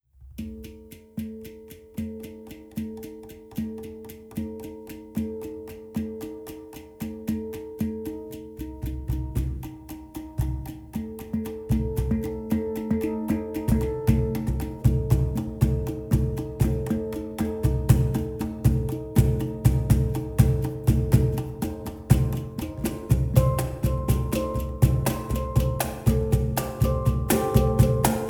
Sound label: hang cajon; citypercussion